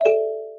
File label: plinko neut